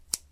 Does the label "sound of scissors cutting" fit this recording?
Yes